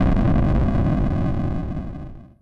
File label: wizard meteor; Adventures in Lestoria